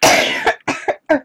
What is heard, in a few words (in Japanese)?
咳; 音MAD; Cookie